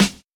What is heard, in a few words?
studio steel snare drum sound g key, Royality free snare drum sound tuned to the G note.; snare drum samples